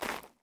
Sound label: zombie footstep; DeadFright